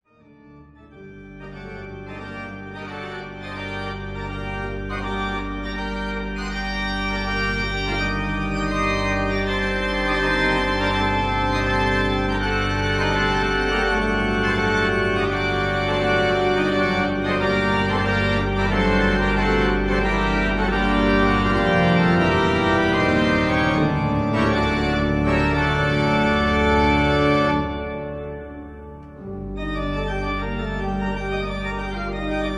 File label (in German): Praeludium und Fuge g moll